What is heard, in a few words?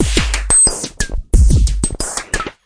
090 bpm noise brigade, DRUM LOOPS and BREAKS